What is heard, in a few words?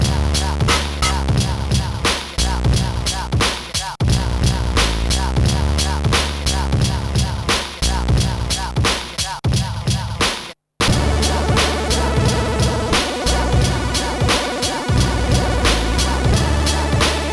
swingbeat ballad; Trackers; Modland